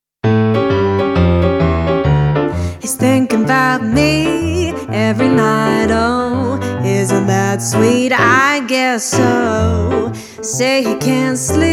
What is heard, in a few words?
Jazz Cover